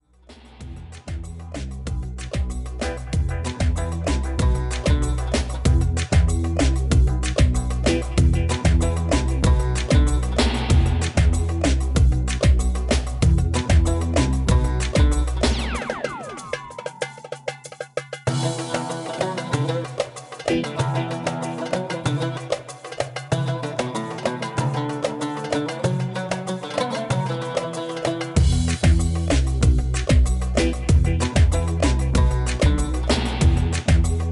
Instrumental

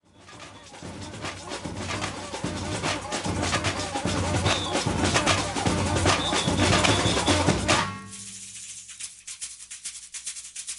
Casa Samba

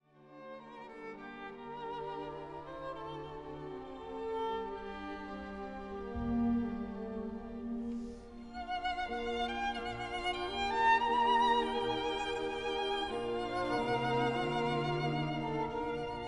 Sonata For Violin And Continuo in G Minor; Il trillo del diavolo

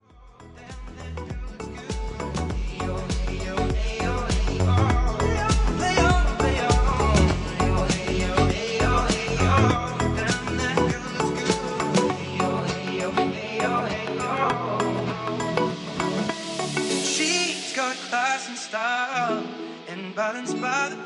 FUNK CHILL DISCO

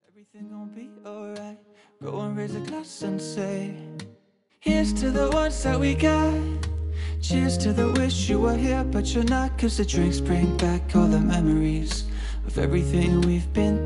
Acoustic

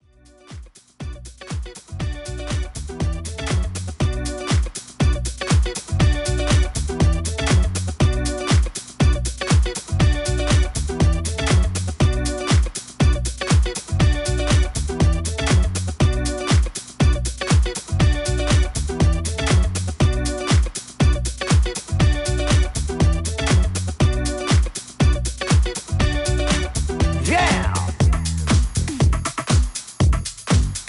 FUNK THE BEAT CLAP YOUR HANDS

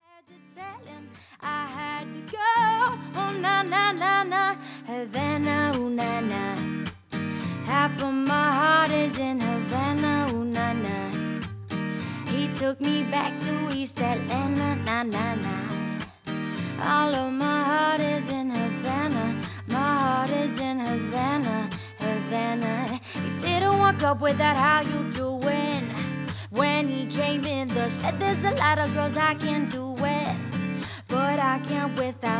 Acoustic

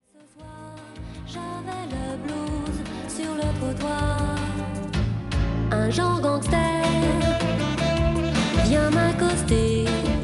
Blues

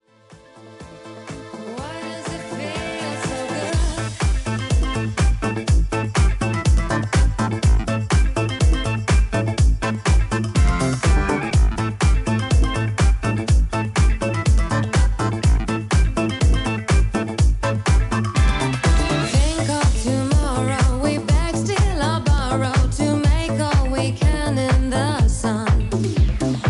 Disco

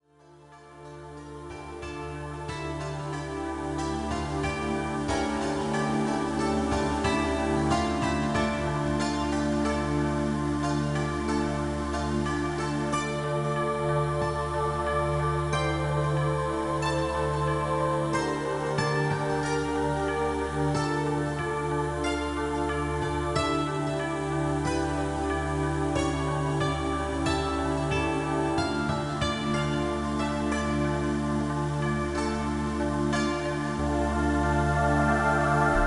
Music For Harmony And Balance Tai Chi Chinese

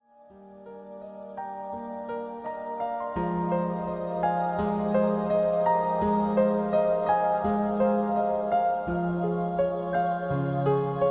HEALING MASSAGE

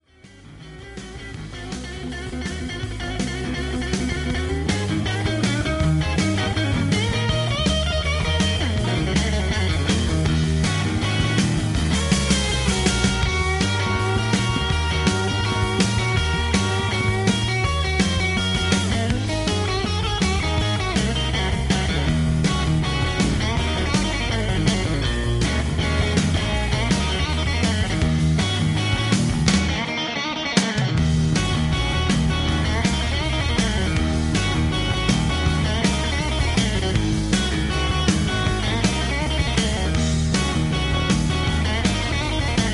GUITAR SOLO

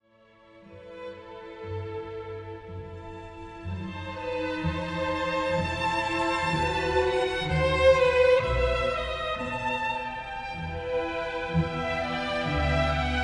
Air On a G String

